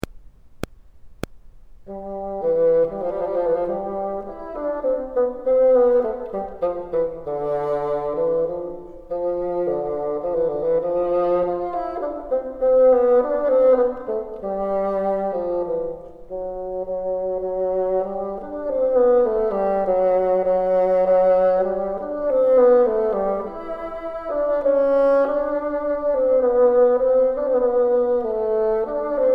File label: top, bassoon